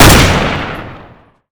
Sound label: mag7; arccw go